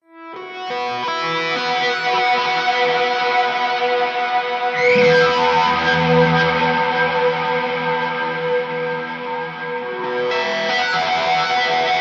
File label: WOODY CHIMER